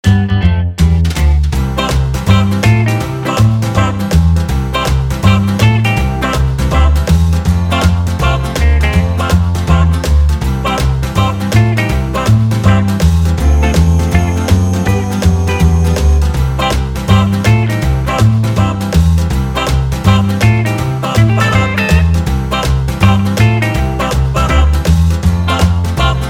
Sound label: reel spin tune; Archie